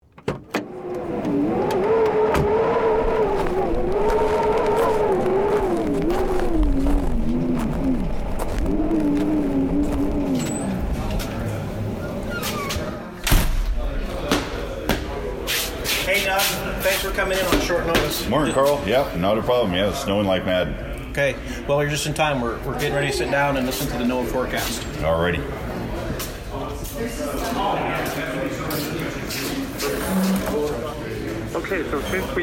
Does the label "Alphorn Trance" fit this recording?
No